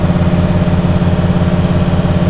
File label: MOTOR, Slot Car